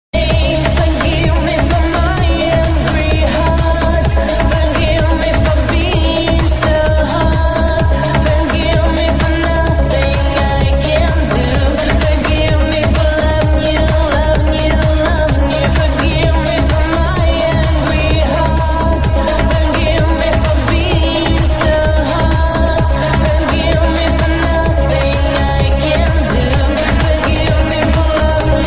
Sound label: Beat